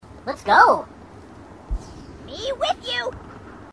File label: grunt; h2dialogue; grunts